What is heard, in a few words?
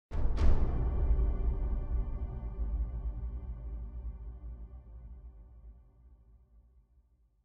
Big Drums; Citdel Big Drums; Frozen Flame; windows